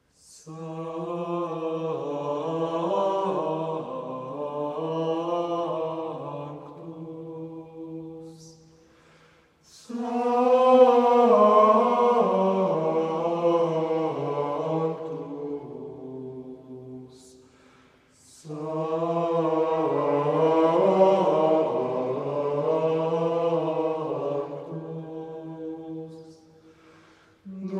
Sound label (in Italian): Canto Gregoriano Sanctus; Catalogo musicale musica antica